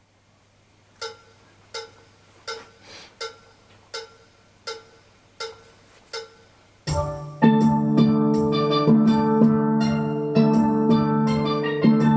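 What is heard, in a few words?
GUITAR, Steel Band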